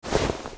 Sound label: equip leather, armor; item; Minecraft; Votive World